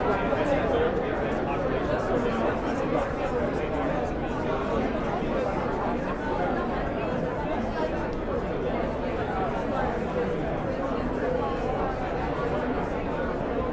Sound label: Crowd Long